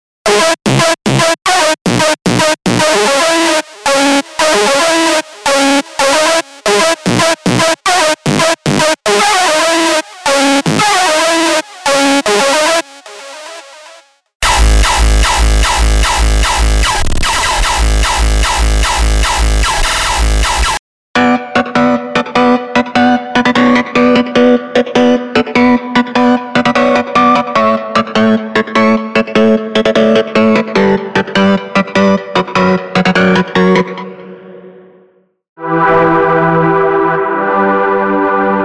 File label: Hardstyle; unisynth